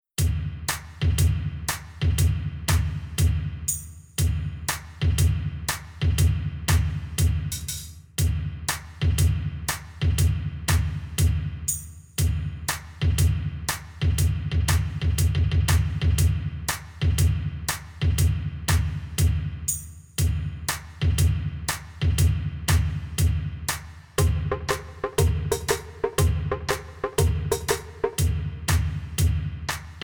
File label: only drum